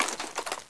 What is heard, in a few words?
bag toss